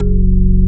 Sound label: ORGAN